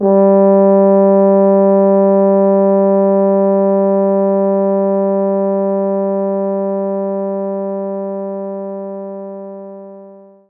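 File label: French Horn mezzo forte G2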